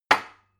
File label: glass put down cupboard hard